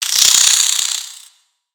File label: Spider Rage; Lego; creatures mechanical